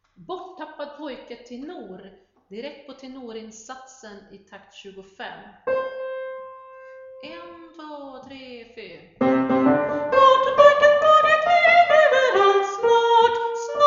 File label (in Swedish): tenor; Borttappad pojke